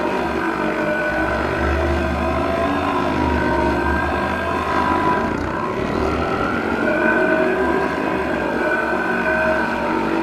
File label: Prop Warehouse Prop Rack Move Close; Titanfall 2; Boomtown